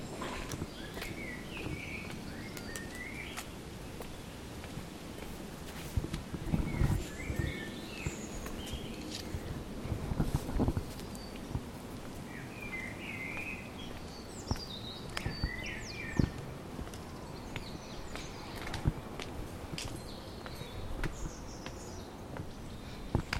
a burst of beautiful birdsong on my walk to the tube